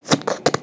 Kitty Cat Licking; creatures organic; Backpack

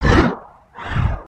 idle, creatures organic

